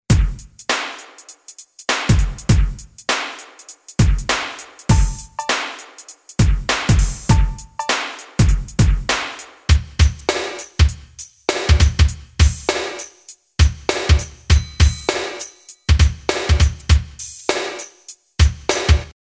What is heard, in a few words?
hiprap